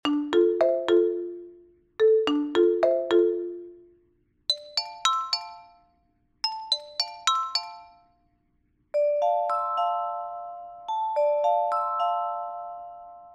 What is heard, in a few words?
marimbas